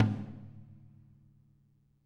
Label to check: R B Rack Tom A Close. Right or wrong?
Right